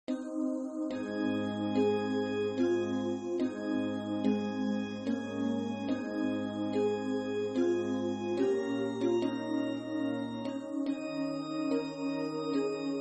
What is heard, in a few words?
hymn bass; 末日聖徒イエス・キリスト教会